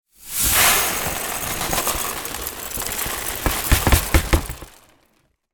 foley small plastic beads pour into trash can from tray